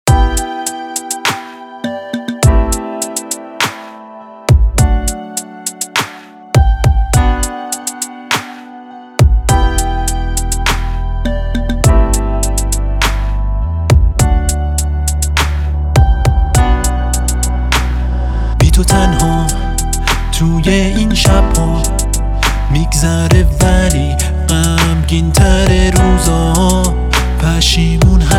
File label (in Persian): ملودی پاپ